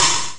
open h hat; ST-XX Amiga Soundtracker Sample Packs

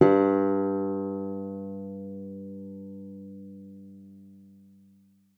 G2, guitar; Xsample VOL-2

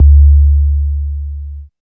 808 KICK; East West - Phat & Phunky CD1; 90's Sample CDs

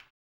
HAMBONE; ILIO; Vocal Planet VOL-2; Blues & Soul

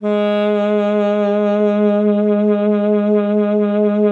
G#3, alto sax; Xsample VOL-2

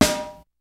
kicks snare, kid; Kid Nepro; Elite; KICKS SNARES

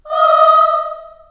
D#4 CHS AH-L, CHILD AHS, Choir; Acoustic & Synth; AKAI; USB Soundscan vol.28

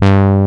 ANA BRASS; Pro-Rec - Synthsonic; JV ANALOG1

